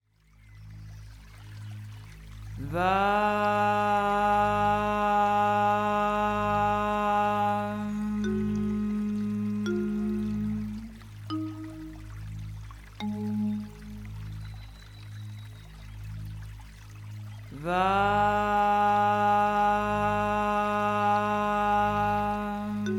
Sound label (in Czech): Mantra sakralni cakra